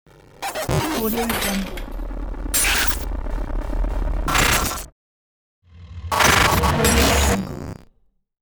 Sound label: abstract glitch